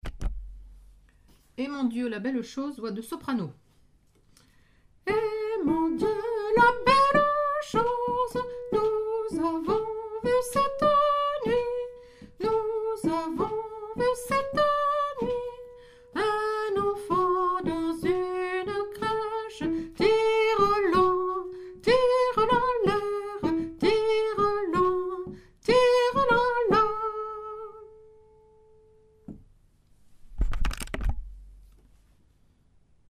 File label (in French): soprano; eh mon dieu la belle chose